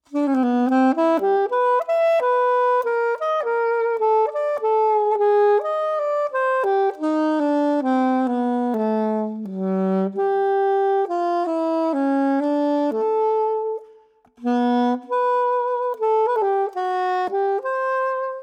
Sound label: CONN O SAX; timbri